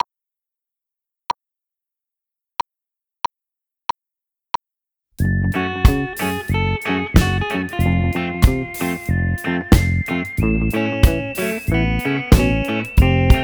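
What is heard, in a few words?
Guitar Drums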